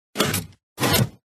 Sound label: piston minecraft